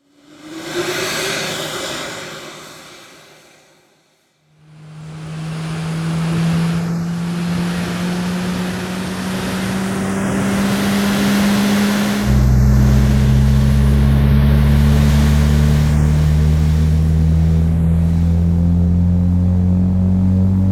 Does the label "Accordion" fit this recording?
No